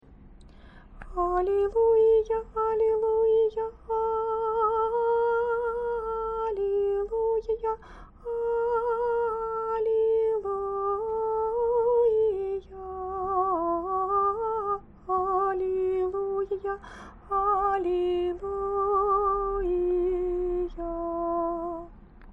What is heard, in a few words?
halleluja, singing